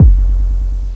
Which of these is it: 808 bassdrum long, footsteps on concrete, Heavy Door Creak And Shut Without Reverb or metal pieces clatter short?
808 bassdrum long